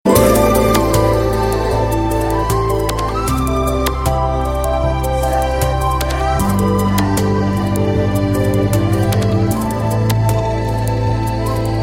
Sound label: Soft Tone